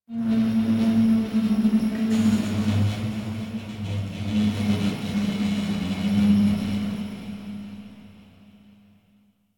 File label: Ship Metal Movement Texture Large, Ambience; Warframe; Corpus Ship Remaster